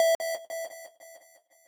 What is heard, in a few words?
lead